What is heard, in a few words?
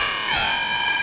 Sci Fi Loop; Sound FX